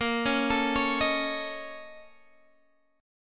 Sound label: A sharp Minor Major 7th Double Flat 5th, down, Guitar, Standard; arpeggiated; capo=2